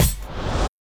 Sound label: Parry; Hollow Knight; Hornet